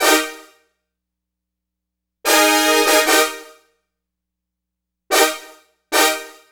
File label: Brass Section; Synthetic Winds; Dr. Fink's Funk Factory